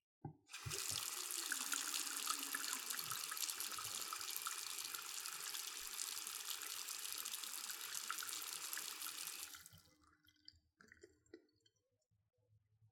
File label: Tap Open And Close